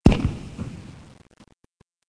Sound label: Cannon Light; Learn to Fly 3